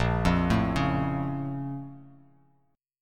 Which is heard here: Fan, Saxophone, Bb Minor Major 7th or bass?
Bb Minor Major 7th